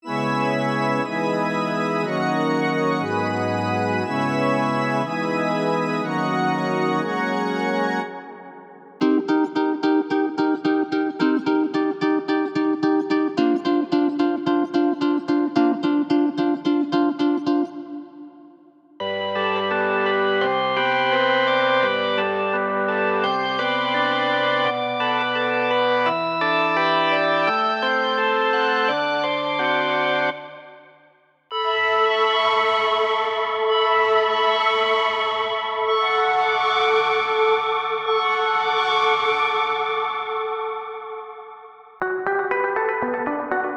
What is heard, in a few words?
Gospel